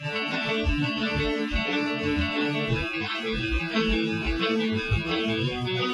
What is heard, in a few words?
Groove